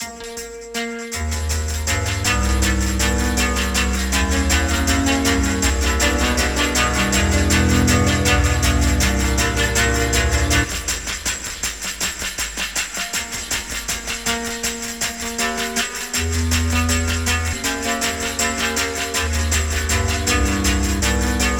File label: TWANGS; Zero G; Malice In Wonderland